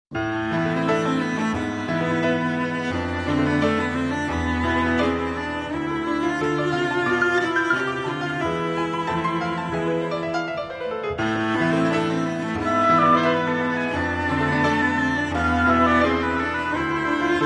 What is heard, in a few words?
instr